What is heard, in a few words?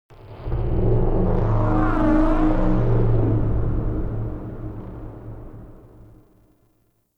Low Growl; Warframe; The New War; Zariman; Apartment; Scene Six